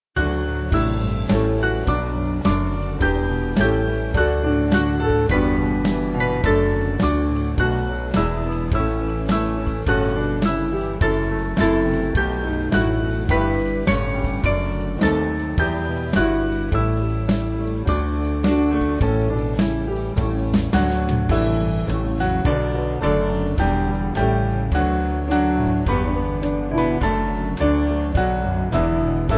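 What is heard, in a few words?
Band, Music Backing Files for Churches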